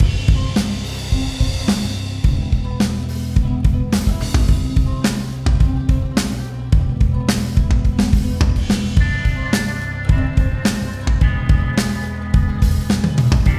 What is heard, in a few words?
Boss Victory Loop